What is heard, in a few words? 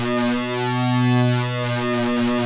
BEE; moonchld